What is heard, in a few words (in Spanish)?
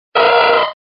Grito de Electrike